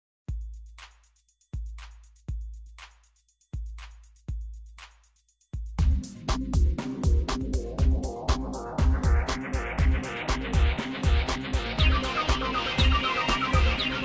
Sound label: Game Music; RocketMan